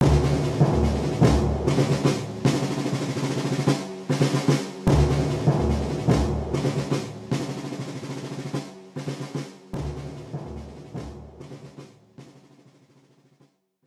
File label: Load the Cannon